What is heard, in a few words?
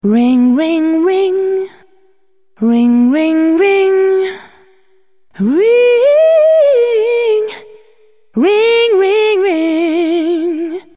Ring Ring Ring, ringtones